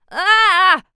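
vocal female vocalizations pain vocals zapped scream; human sounds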